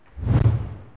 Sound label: Title Swoosh; Wild Animal Racing